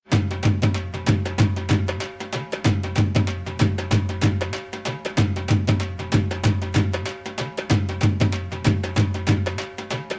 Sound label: Dhol Rhythm; Punjabi